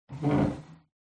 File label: household chair wooden old slide scrape wood floor short